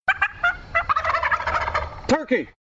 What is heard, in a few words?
turkey gobble yell ringtone